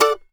MANDO D MAJ R; Sampleheads; Whole Lotta Country 1 & 2

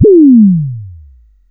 TOM KIT C4, TOM SYNTH; AKAI; USB Soundscan vol.20 - Fresh Disco House I

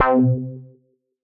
Bass C3; radiosonics; Policebox Bass

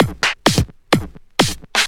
Clubhouse Loop 128 BPM

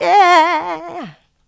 Vocals, Adlibs; Houseworx

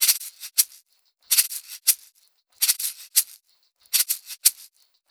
PERCS R, Roots Funk; USB Soundscan vol.41; AKAI

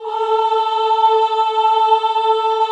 CHO, Roland; Sound & Vision - Gigapack I CD 2 (Roland); Mix-Combi 1

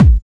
BD; Best Service; Dance Mega Drums

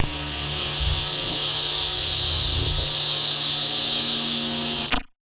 Casio, Glitched, malfunctioning synth

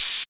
HH HH, Dance

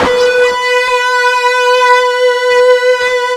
FUZZ B 3, ULTRA FUZZ, Guitar; East West; Steve Stevens Guitar Samples Collection

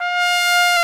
BRS TRMP, trumpet; Roland L-CDX-03 Disk 2; BRS Tp 4 Bach

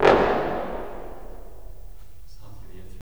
BRS, Roland LA Composer

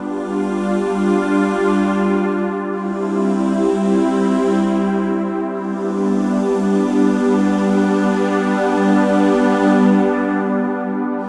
String Loop; Drum & Bass

